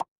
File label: cartoon pop